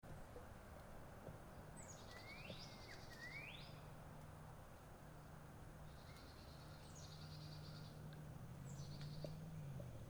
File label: Church in the Woods